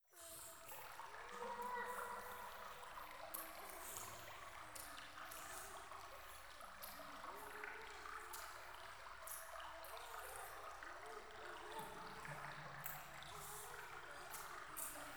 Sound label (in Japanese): イラマチオ 川のせせらぎ